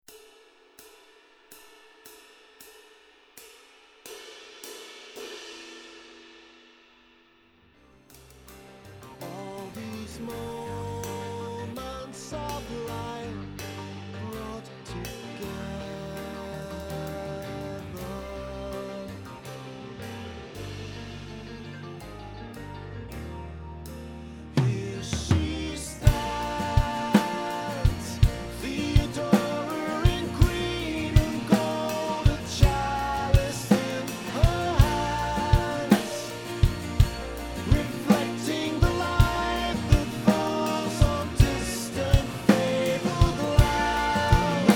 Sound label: ride cymbal